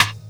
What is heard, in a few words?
SD HOU, Dance Mega Drums; Best Service